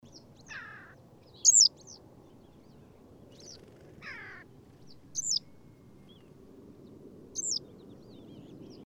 Black-headed Wagtail Motacilla feldegg feldegg, call, Kommunizm; Kazakhstan; Aitbuzum